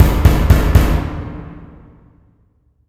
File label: Knock; Knock 2